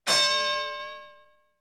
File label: boxing bell